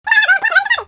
plump

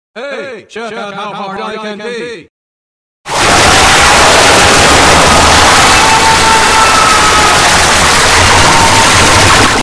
pee; cave

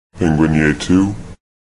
penguin yay

